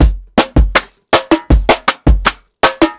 PICCOLO 80 BONGO, drums; Basement drums vol 2

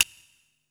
SNAP; USB Soundscan vol.34; Burning Grunge Hip Hop; AKAI; TIMBA

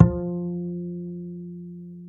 ACOUSTICBASS, F 3; Masterbits CLIMAX 7 - Bass

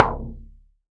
Plastic Box, household rhythm samples Hits

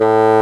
bassoon; Roland L-CDX-03 Disk 1; WND Bassoons

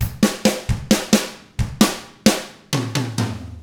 SLOW ROCK, Drums; ILIO; Double Platinum Drums 1

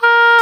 oboe, Roland

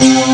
synthe metal gros; Amiga Soundtracker Sample Packs

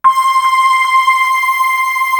HYPER B, BELLS; East West - Global Sounds

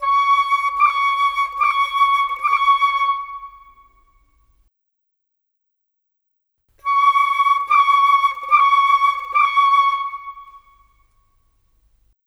Flute Oboe, Cinematic; Sonic Foundry (Sony Creative Software); Orchestral Series VOL-3 - Cinematic

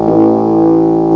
BASSOON, REEDS; CMI Sound Library Rev 1.3

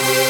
Synths Root C

